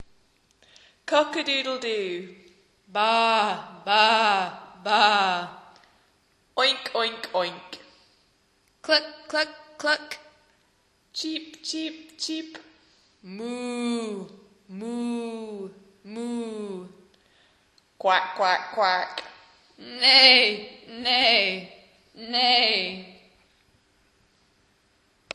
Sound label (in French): Bruits animaux; Anglais; Say hello to the animals